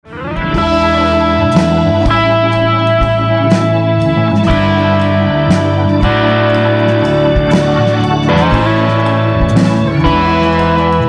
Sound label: downbeatclub